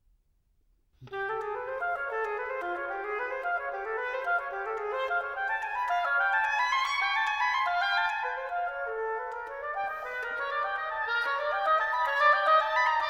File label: Diaphonic Suite No. 1 for Oboe III. Allegro; crawford seeger; chamber works